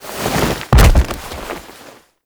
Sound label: Foley Bodyfall; Tenno; Warframe; The New War; Throne Room